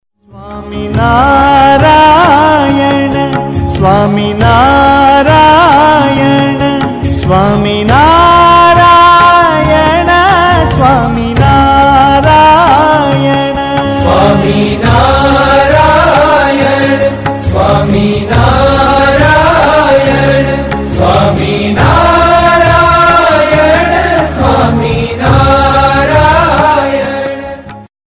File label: SWAMINARAYAN DHUN